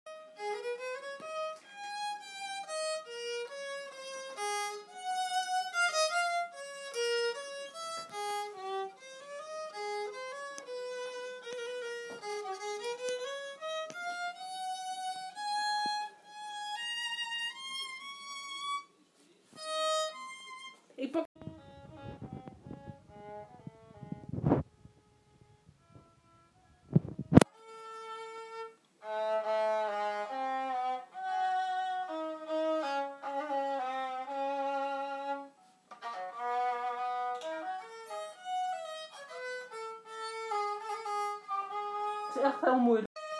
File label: 3d printed violin